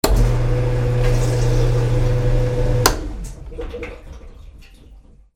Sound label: HOUSE